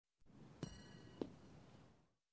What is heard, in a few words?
Horse hoof sound